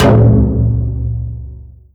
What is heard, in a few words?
impact, metal barrel